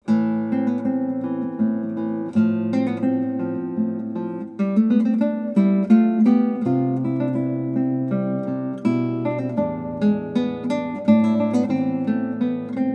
Guitar Recital; Gitaarboek

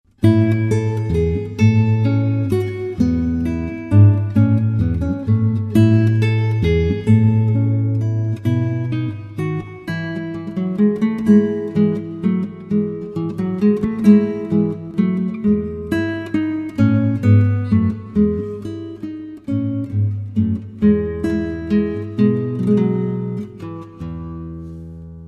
Menuet I en II

